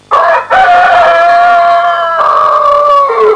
Farm rooster crowing

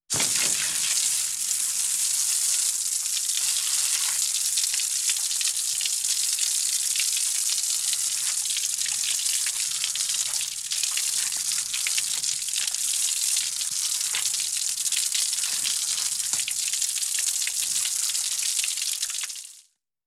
Washing Faucet running

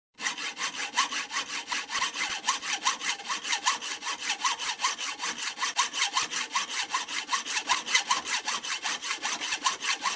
Hand sawing a metal bar

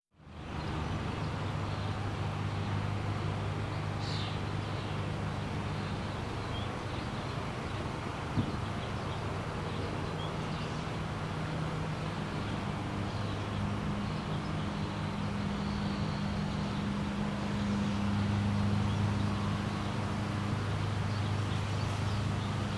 Calm City Street ambience

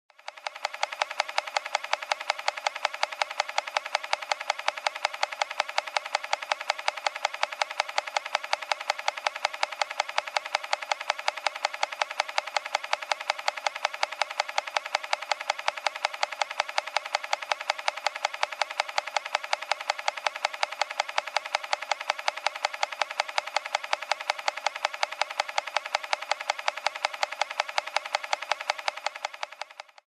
Rapid wood tapping

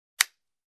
Switch clicking

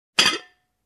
Glass put on table hard

